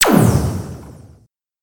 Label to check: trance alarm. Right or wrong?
Wrong